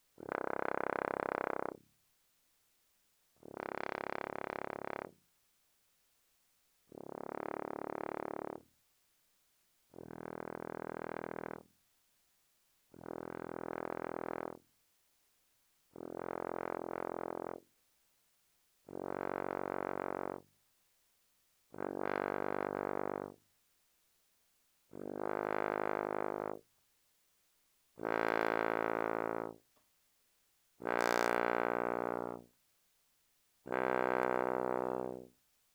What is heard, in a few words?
Tuba ff